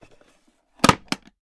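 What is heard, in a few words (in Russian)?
короткий приятный скрипучий открывания старой замочной щеколды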